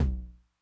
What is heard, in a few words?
Tom Low Low Tune Mid Decay, 909